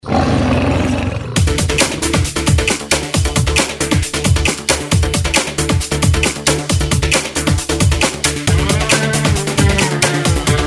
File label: liquid dub break